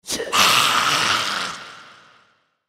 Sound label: feral ghoul aware; npc